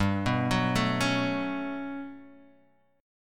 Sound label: G Major Sharp 11th G down Guitar Open G